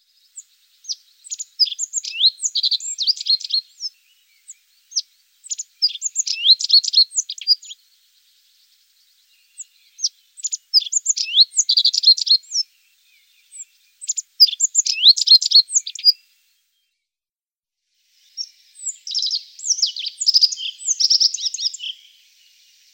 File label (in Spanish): escribano montesino